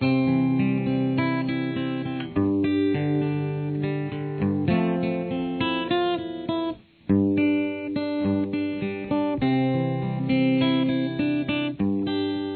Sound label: theme, guitar; acoustic version; Unplugged